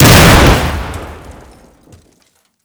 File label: explosion door metal breach; Explosions